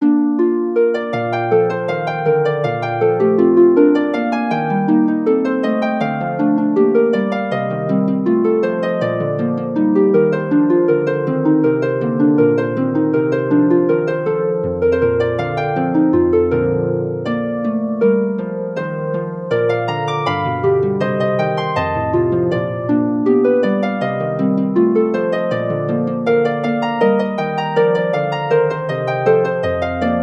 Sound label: for harp; bach johann sebastian; chorale du friedefa rst herr jesu christ